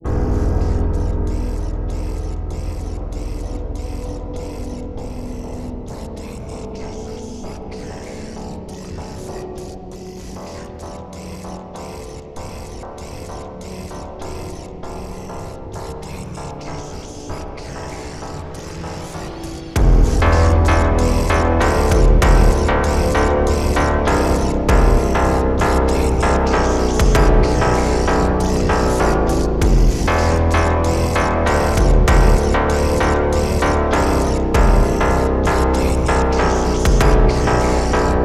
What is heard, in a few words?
Phonk Music; فانک phonk